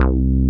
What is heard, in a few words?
MOOG BASS